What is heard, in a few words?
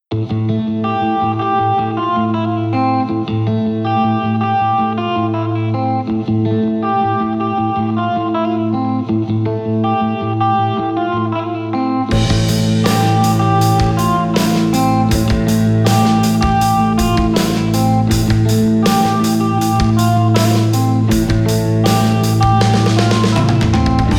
ambient dream; weeklybeats 2024